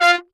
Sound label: Trumpets; pitchglitch